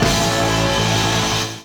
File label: Grind Hit Out, Percussion; Beat Components